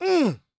shouts, gospel preacher

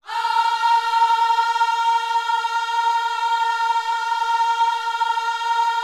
OHS A#4C, gospel vocal; Spectrasonics; Vocal Planet Vol.1 Gospel; akai

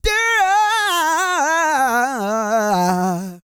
GOSPEL

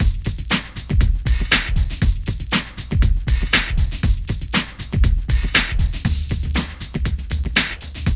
PHAT LOOP; E-Lab; X Static Goldmine 2; akai

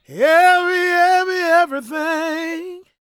GOSP MALE, Vocal Planet Gospel

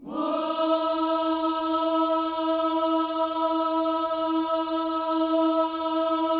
WHOO E 4, Vocal Planet Gospel; Spectrasonics; Vocal Planet Vol.1 Gospel; akai

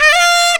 SAX TON; E-Lab; X Static Goldmine 2; akai

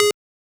BLIP

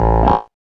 SYN SEQ

